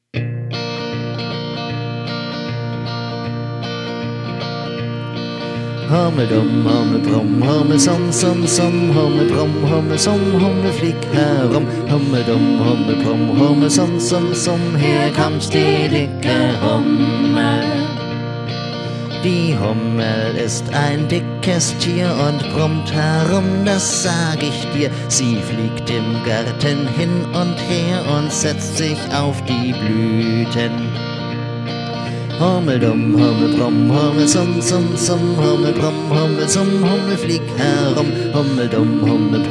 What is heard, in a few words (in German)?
Hummelfluglied